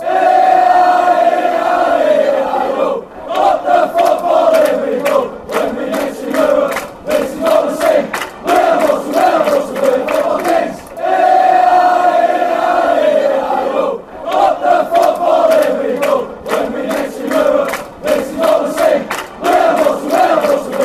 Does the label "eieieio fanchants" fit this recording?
Yes